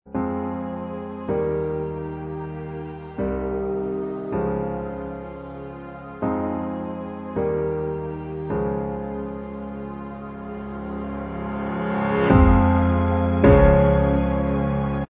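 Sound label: instrumental, backing tracks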